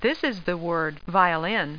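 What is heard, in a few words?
violin; Tandy Sensation II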